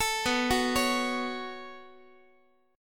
B 7th Suspended 2nd B down Guitar; B7sus2 arpeggiated; 4A,4E,5A,5D,5G#,6C#; chord shape; x,x,12,9,10,12; JGuitar

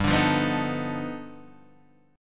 G 7th Flat 13th; G7b13 strummed; JGuitar

